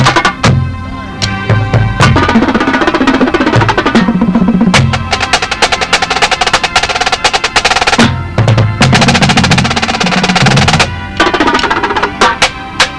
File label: Quad Bass Snare Lick